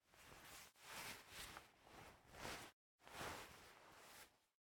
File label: Player Click; game audio; MilaT; MiSide